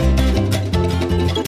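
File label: ocean world music cage forcefield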